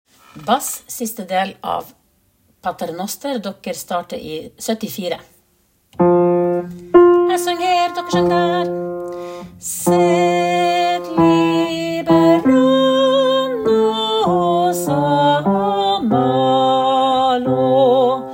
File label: BASS Paternoster